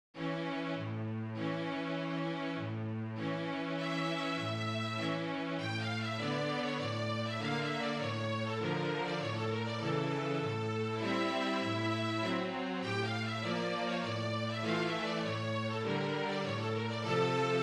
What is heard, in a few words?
Bartok Dances No4, Six Romanian Folkdances, scored for violin and cello only; No. 4 "Tanz aus Butschum"; from Six Romanian Folkdances - Bartok